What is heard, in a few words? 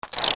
Freeze; Link getting frozen